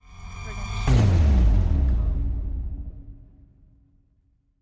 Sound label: Bass Drop; SoundsCrate